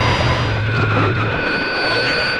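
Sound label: rhythm loops, 100 bpm; Rarefaction - Poke in The Ear With A Sharp Stick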